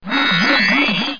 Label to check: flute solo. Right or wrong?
Wrong